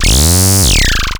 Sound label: Acid Sounds F#, Synths; Vengeance Samples